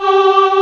CHOIR G3; AKAI S-Series CD-ROM Sound Library VOL-2; VOICE

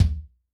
Bushy, Kick, Yamaha DTXpress-II

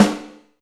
Snare, Alesis DM5

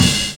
CRASHING; Univers Sons

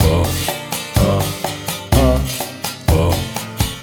Vaguely Ethnik Full C, Lounge; Zero-G Kocktail Kollection; Construction Kitz (Lounge)

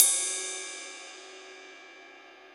RIDE PING; E-MU Formula 4000 Series Vol. 5; Protozoa

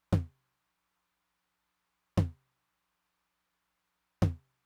mid tom with, Roland TR-909; drum machine; Roland TR-909 Ableton Project

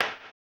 HAMBONE, Vocal Blues Soul

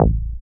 DR. LOOP, BASS; X-Static Goldmine 3

